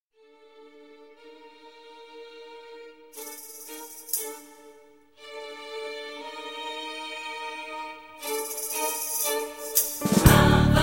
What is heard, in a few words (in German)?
Vocals, Studiochor